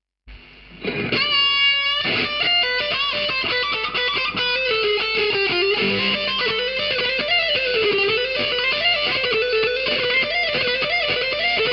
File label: metal